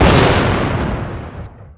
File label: Explosion; Worms3D